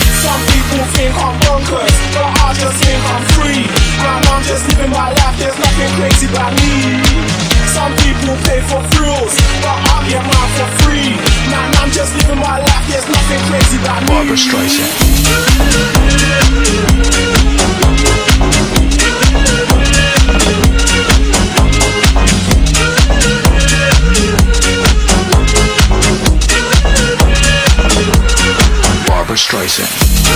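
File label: 128bpm E flat minor; remixplanet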